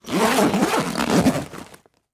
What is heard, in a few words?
Duffle Bag Zipper; Sound Effects Bible